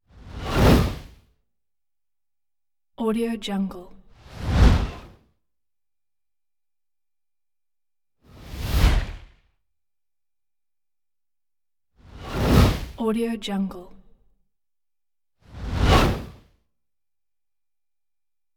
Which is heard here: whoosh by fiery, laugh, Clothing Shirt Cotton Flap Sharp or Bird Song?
whoosh by fiery